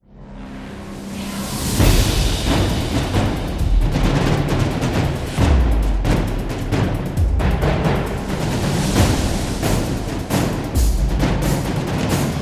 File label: Air Force, war drums